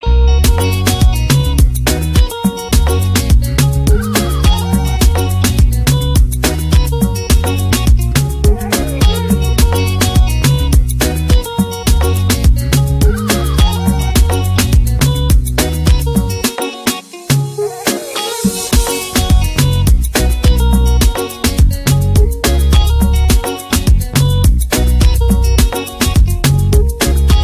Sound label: Instru